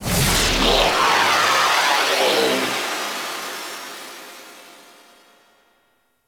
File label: Reactor Power Absorb; Ambience; Props; Corpus Ship